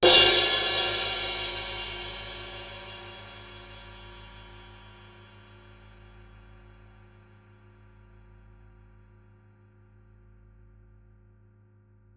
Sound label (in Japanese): シンバル; 体鳴楽器; 打奏